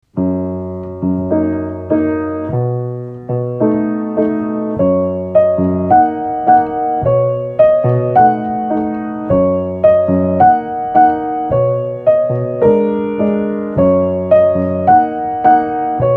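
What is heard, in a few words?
Jazzy Crocodile (the), Piano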